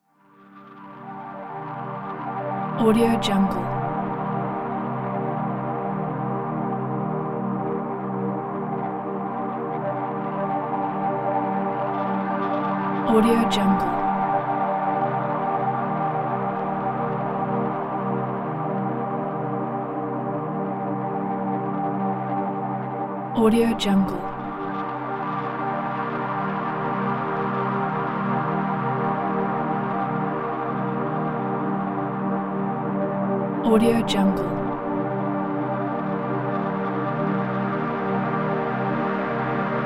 electronic scifi meditation soundscape

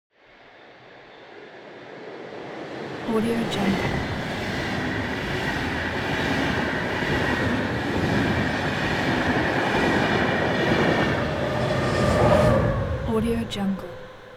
transportation train passenger drive by

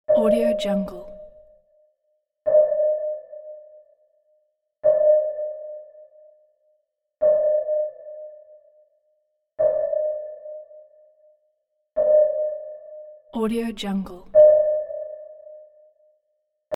sonar on a submarine; pentaxim

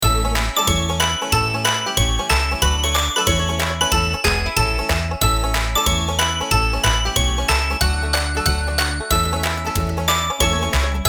country kids deck the hall logo; drtimwaters

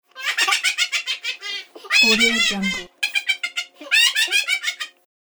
cartoon laugh

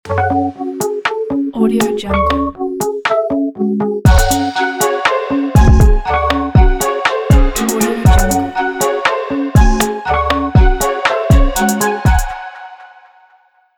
hip hop intro jingle ident

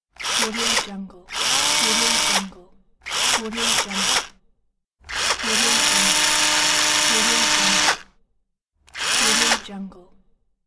screw gun

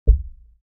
human body heart beat single high; smartsoundfx

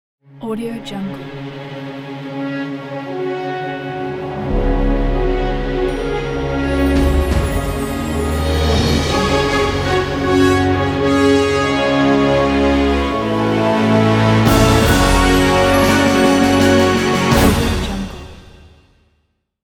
film company logo

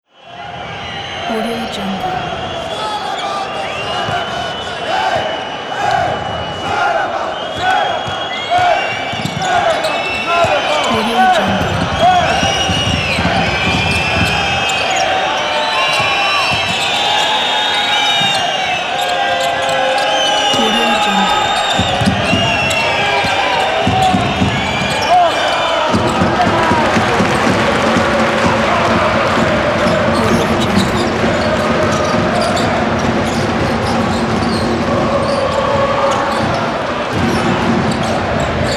basketball arena crowd whistling and shouting

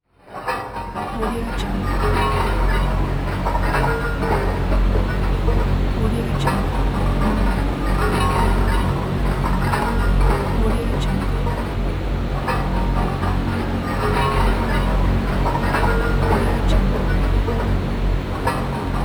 dark metal debris background; cinematic designs

